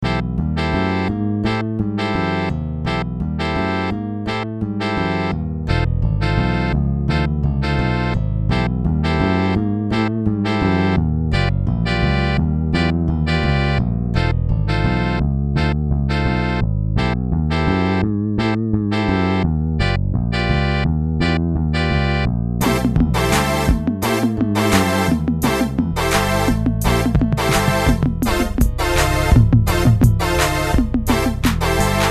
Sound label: MIDI Blues